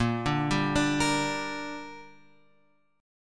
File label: Bb 6th Bb, Guitar Open F; Bb6 arpeggiated